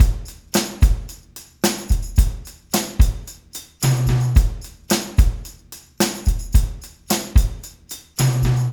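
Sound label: beat, Room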